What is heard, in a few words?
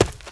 move; GrandFantasia